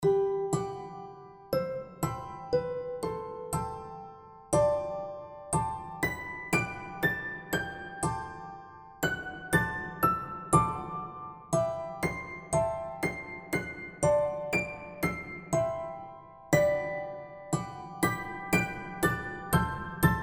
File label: piano; unas walzer fuer salome